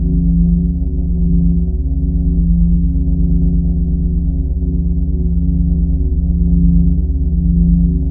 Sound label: Background; UltimateXR; FullScene; dungeons